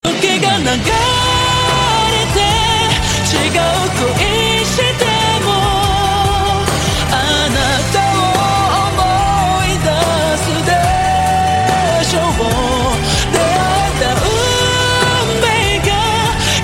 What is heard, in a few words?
male ver